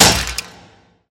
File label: metal impact; soundscrate